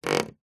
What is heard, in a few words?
household door wooden creak short